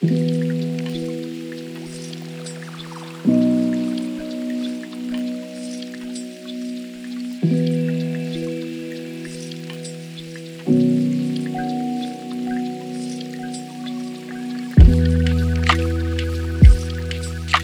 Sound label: lofi hip hop